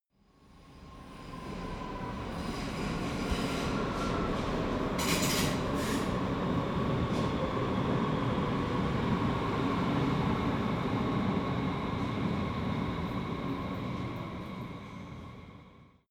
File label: train underground station arrive; bahnverkehr u bahn